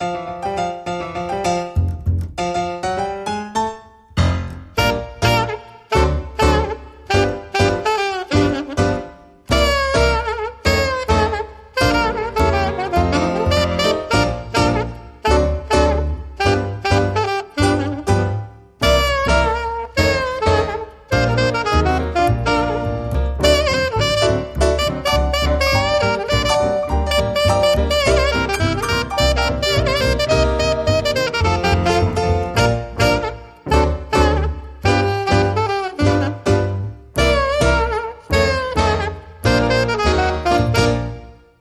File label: Charleston, No Drums